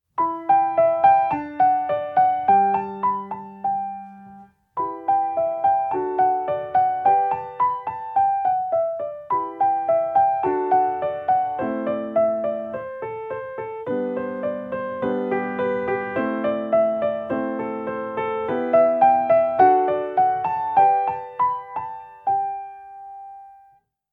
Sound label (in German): glockenklang